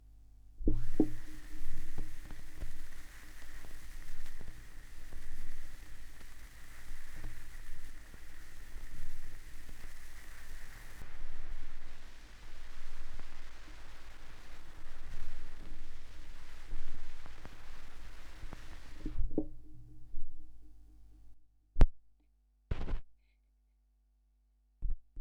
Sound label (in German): schallplattengeraeusche, Tape Echo Mix